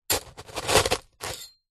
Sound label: foley metal dig into small stones then remove